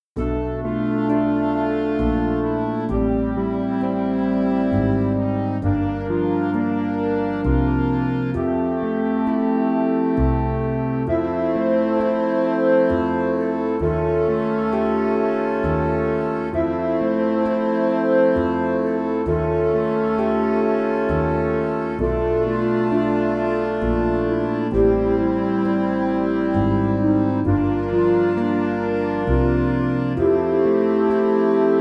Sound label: jazz combo